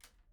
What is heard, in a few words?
pendrop; IEEE AASP